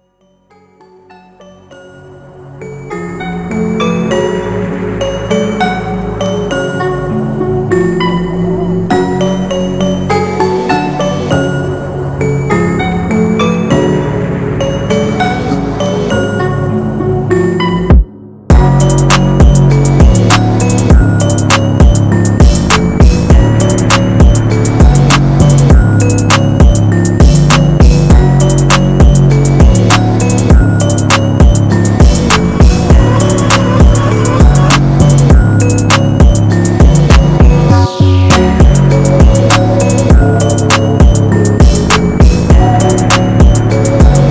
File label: dark type beat